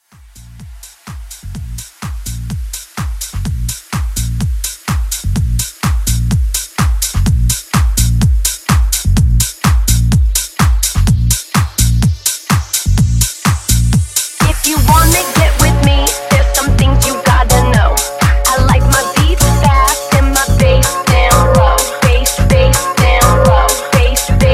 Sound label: House Vocal 126